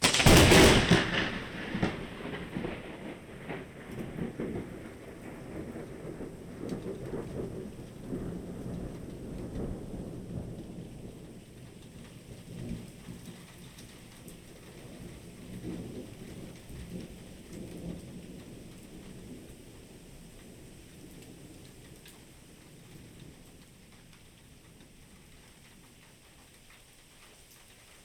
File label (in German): Blitz; Lexikon-Roman